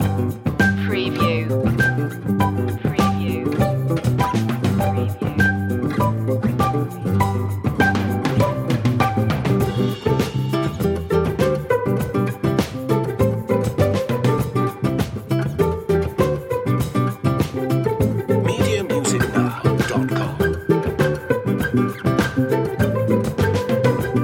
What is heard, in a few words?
loop; sand